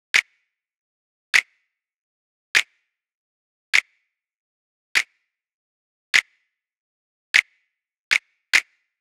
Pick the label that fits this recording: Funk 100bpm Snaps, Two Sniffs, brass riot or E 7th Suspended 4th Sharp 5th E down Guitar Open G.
Funk 100bpm Snaps